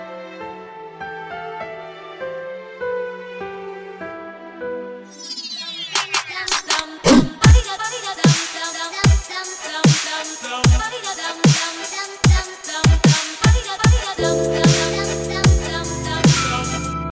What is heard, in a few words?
Trap Drop